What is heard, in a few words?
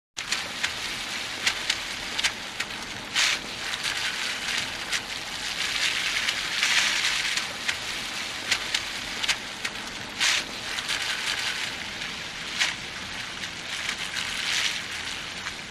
Tires Wet Surface